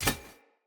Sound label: Unarmed Parry Impact; Weapon Impacts; Player; Combat